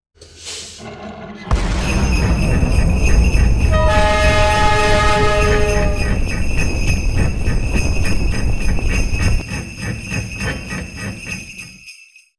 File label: Train leave